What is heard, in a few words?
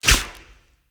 lantern rope cut; Objects; Ode Lantern Crate